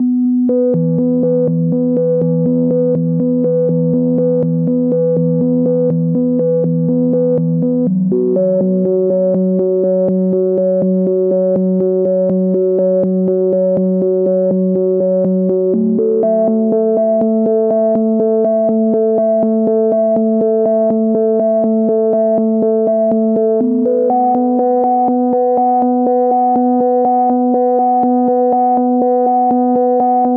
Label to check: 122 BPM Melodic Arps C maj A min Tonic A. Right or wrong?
Right